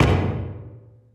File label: footstep; Barotrauma; Barotraumatic; xanHuskmutantarmored